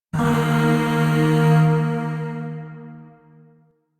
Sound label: Shawzin Chromatic Chord G; Chords; Warframe; Zariman; Void